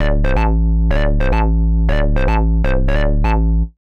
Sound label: Hard Synth Bass A, 125; PowerFX - Nu Jazz House